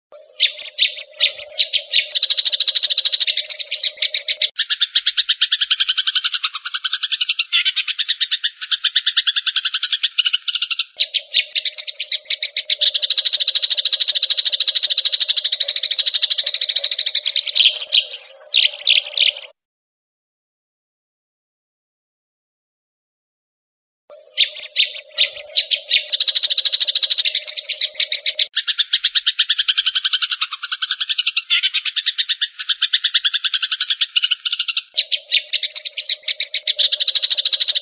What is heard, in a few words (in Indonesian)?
Kombinasi Suara Burung Cililin dan Burung Gereja Merdu Gacor